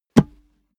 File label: step barefoot metal single trample left